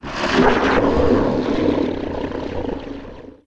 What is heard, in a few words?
ambient, statue